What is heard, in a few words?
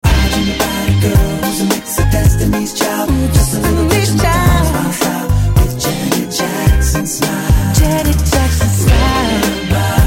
Ringtone